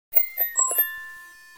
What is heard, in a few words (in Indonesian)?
Nada Dering Lingkaran iPhone 12